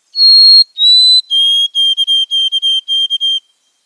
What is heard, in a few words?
Whitethroated Sparrow crystallized song